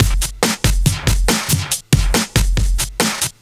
Break Beats, 140 BPM

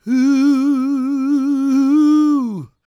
GOSP MALE, SOLO; Spectrasonics; Vocal Planet

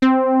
MINI BOUNCE, MINIMOOG

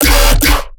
bass hit D, Bass Stabs; demons; Virtual Riot

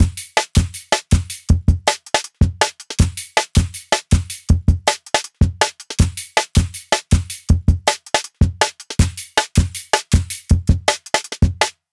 160 Live Jungle Drum Break; LADY 3JANE

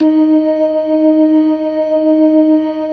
LO MAN V L, ORGAN LOWER MANUAL

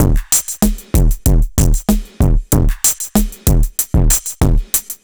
Machine Drums, 95bpm, analogue circuit; musicradar

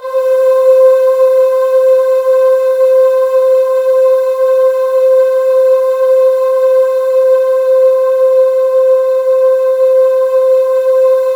C4, Synth Leads

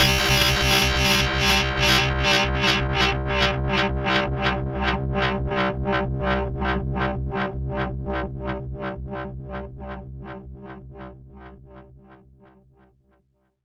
SEA, Trip Hop

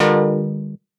stab paradise, stabs

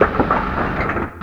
MACHINES

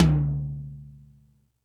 Toms, E-MU Pro-Cussion

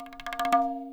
FILL, Percussion

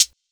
Lo-Fi Shy Hi-Hat; BLOOPFUTURE

